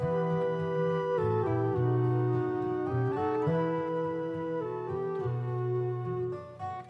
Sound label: flute, Loops, Sad